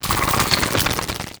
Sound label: extra electrics emerge